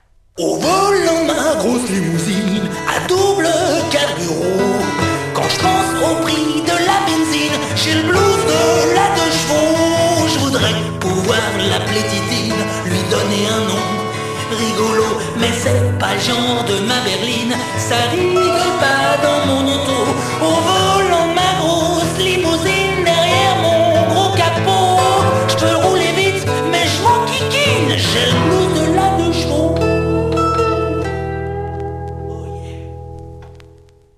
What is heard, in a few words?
blues